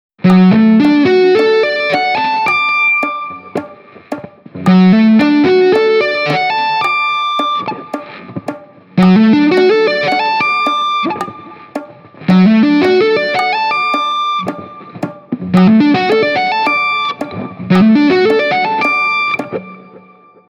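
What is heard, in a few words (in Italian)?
Esercizio Rivolto Ascendente, chitarra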